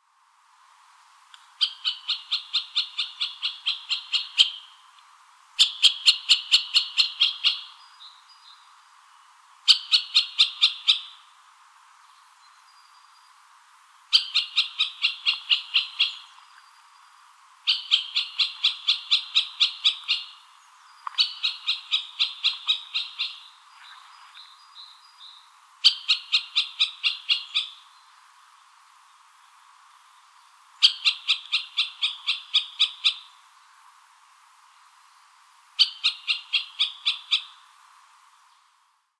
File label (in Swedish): Mellanspett